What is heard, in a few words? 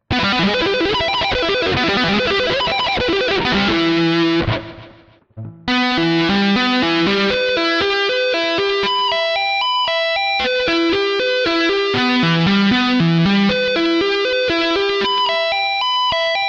shred, guitar